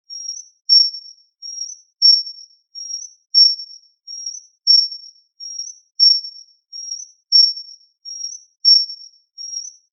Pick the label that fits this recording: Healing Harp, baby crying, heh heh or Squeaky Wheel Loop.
Squeaky Wheel Loop